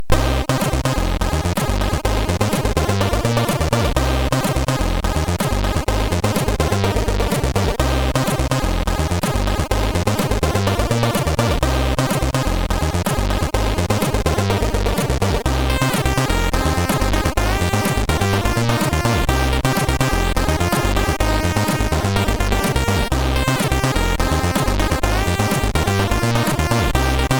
Bass Attack, CSG8580R5; hvsc

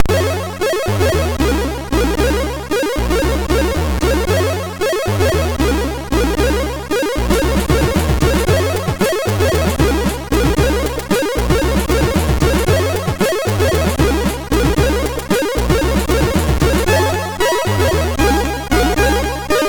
Interlude, MOS6581R3

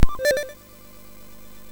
sfx, MOS 6581; MOS6581R4; hvsc

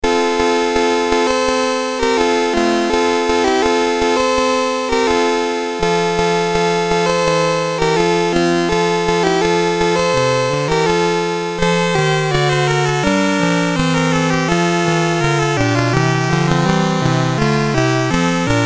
Christmas Album BASIC, Commodore MOS6581R3; C64

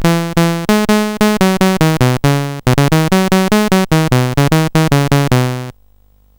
BASIC, CSG8580R5

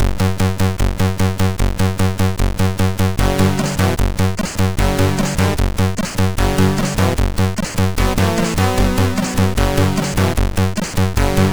sid MOS6581R4; Fire and Forget II

